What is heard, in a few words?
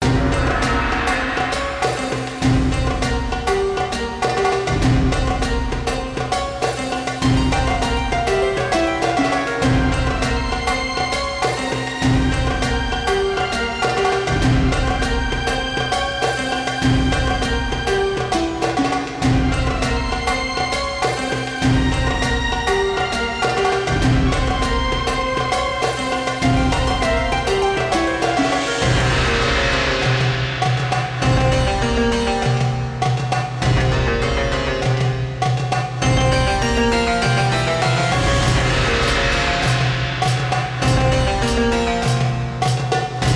Bonus Loop; Temple of Luxor; genesis